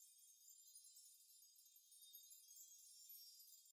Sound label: cupcake shine